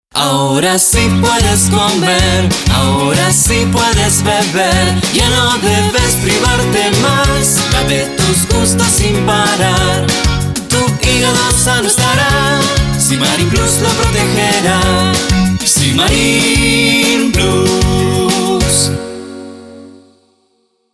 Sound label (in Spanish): Jingle Cantado twist; simarin plus